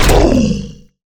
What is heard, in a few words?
hurt; megaspud